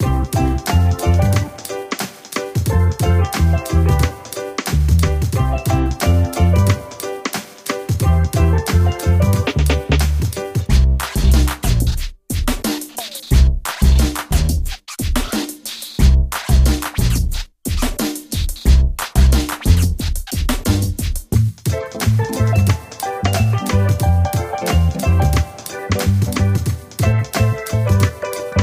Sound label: reggae